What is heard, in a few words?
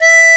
BLUESHARP E2, MUNDHARMO; Best Service; Alpine Volksmusik